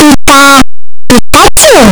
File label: pikachu cry; pokeyellow